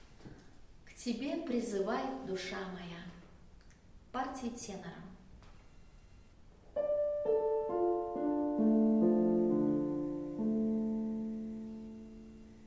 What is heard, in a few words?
Tenor; K Tebe prizyvaet